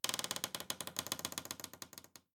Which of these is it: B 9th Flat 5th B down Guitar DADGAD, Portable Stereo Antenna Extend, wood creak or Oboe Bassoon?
wood creak